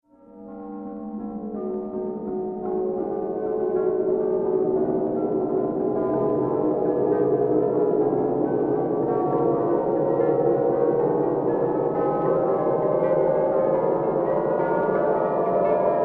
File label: Haunted Christmas Spell, Magic Sound Effects